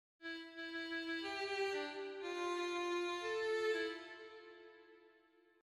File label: Quartet in F Major